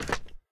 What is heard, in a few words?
spin Button; willyshotchillies